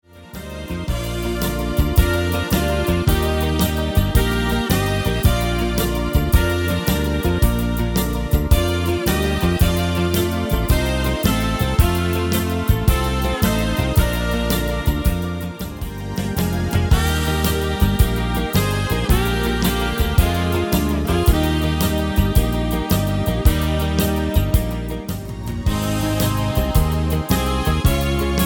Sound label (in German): Golden Saxophon Hits